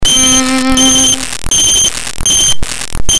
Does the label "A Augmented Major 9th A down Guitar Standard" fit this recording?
No